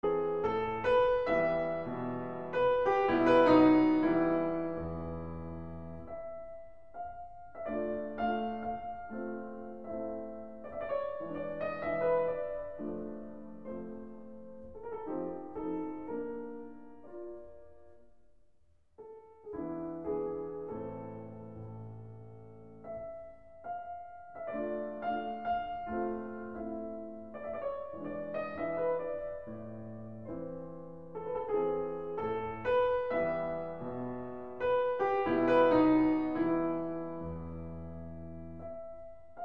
Mazurka in A Minor

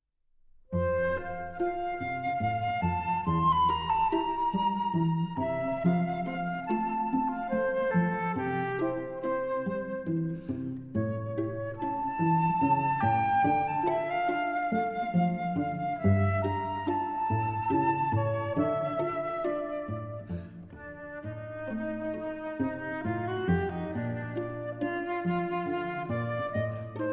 Flute Quartet in C Major, Andante Poco Adagio

